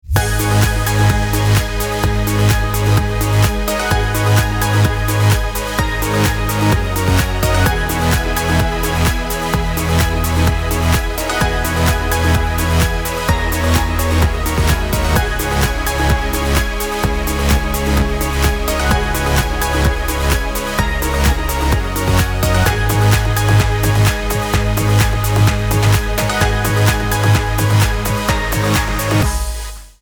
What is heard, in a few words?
Future Funky Beats